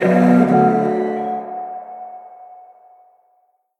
aoe; smite

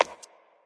footstep; smite; scylla

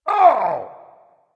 ouch dragon; fafnir

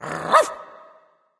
wolf; fenrir

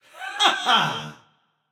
yay; smite; jorm

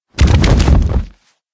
death bodyfall tentacles; smite; scylla

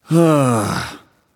nv sigh; smite; voicepack; mercury

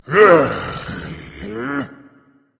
grumble berserker; cuchulainn

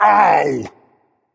ouch berserk; cuchulainn

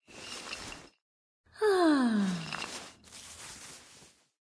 lobby landing; smite; god serqet

